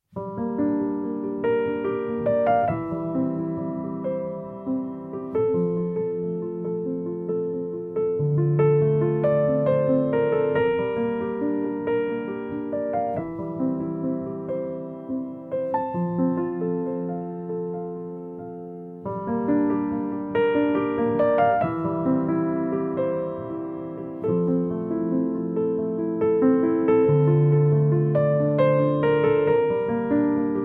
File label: song calm nature; dolphin tunes